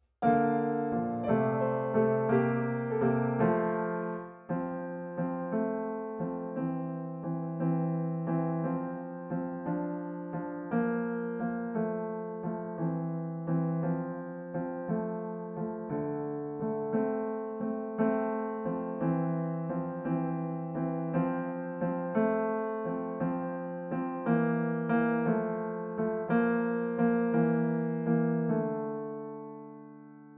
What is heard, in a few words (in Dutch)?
Alleen Piano